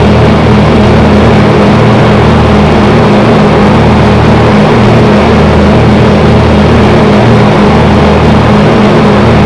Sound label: guns croisiere loop; dod; guns medicinal